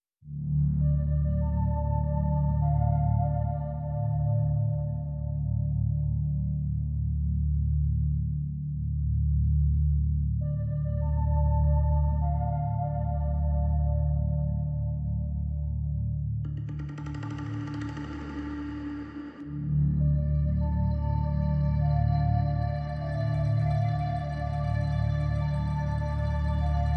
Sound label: Ambient